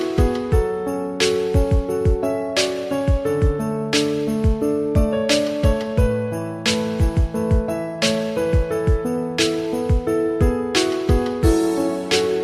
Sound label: Xmas Beat